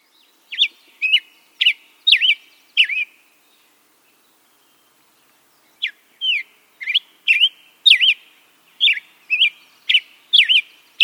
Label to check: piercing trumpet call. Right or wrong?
Wrong